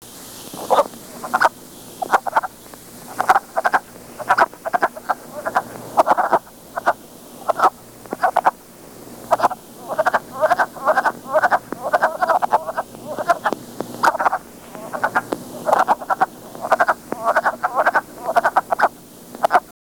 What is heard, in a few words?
wood; fish wild